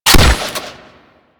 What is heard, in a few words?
Hunting Bow Prime Fire A; Warframe; Weapons